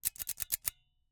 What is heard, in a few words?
Icecream Scoop, metal, mechanical, teeth, gear, squeeze, release, triple; Eiravaein Works; Vaeyan IV